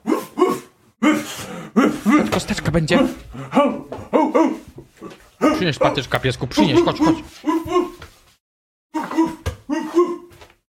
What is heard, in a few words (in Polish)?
szczekanie